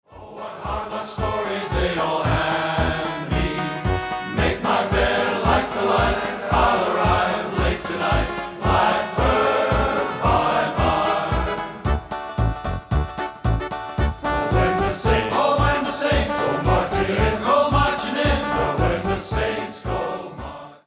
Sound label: swing medley